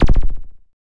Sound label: kick, drums single hits; iElectribe Kicks